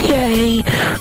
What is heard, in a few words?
"yay"